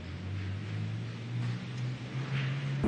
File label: sound fx for cartoon